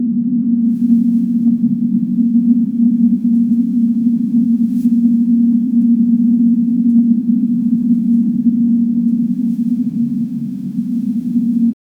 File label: soft ambient city sounds; synth